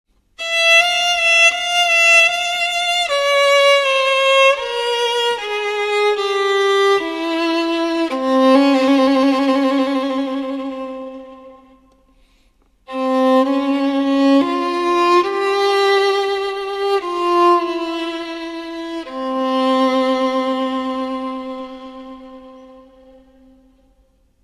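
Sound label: sounds of violin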